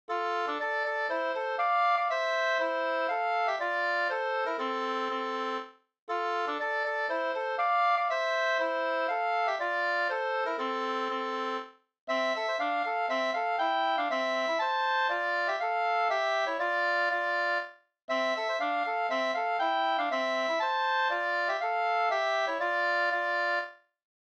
polska fr Vastervik

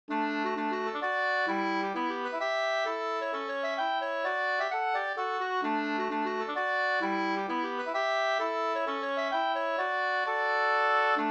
hambopolska; Birgers hambo Up 2st

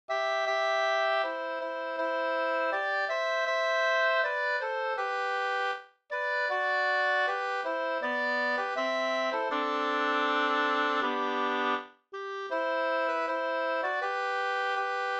vismelodi; Du gamla du friska

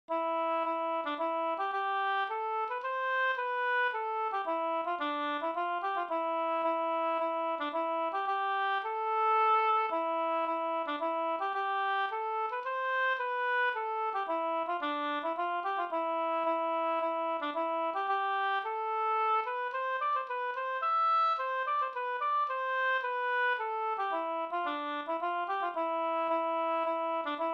hamburska

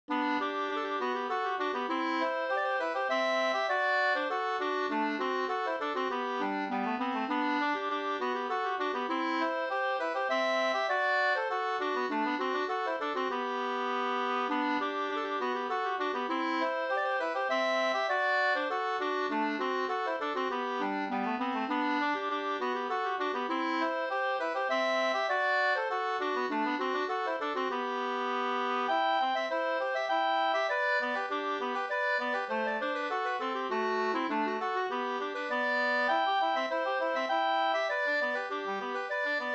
polska polska

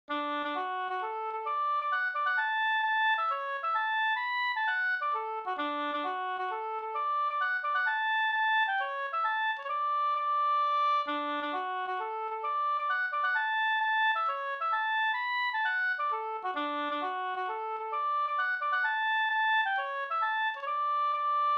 hambopolska; fr Halla

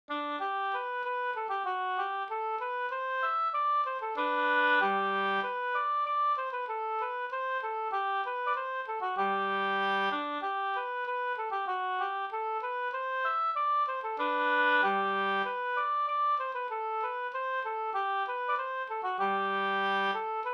skanklat

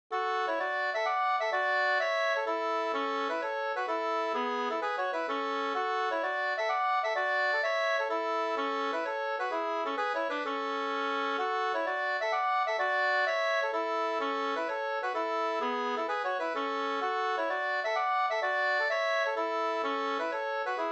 polska, 2st; Carl Magnusson Ds

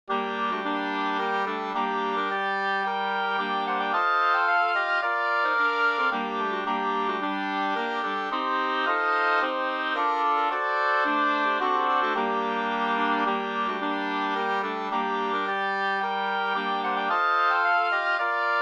polska Prastpolska; Olof Olsson, Galven, Alfta, Hälsingland, född 1856; med andrastämma av Emil Olsson, Uppsala

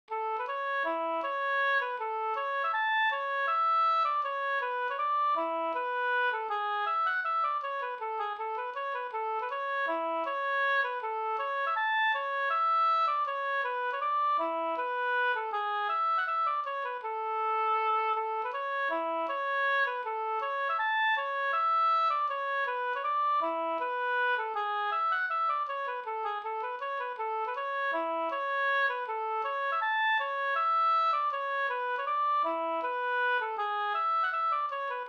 vals Brudvals

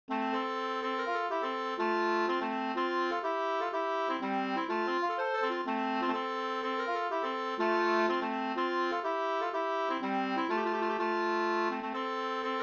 polska; Lovstabruk; C o H Wallin

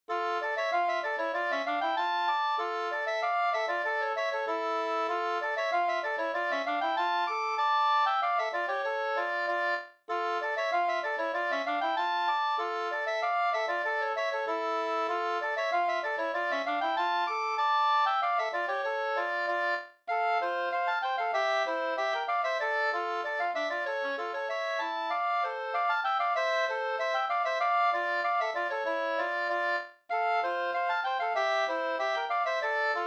engelska; Burs

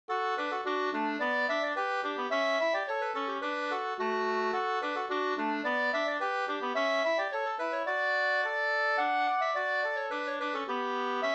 skanklat

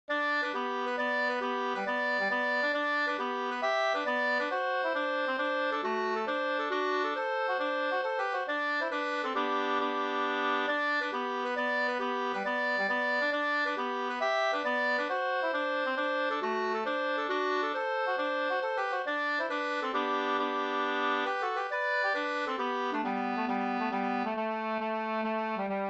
mazurka; fr Hamra e Sigge Mattsson Orsa 2st

